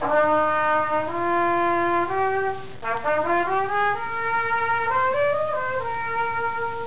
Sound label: trumpet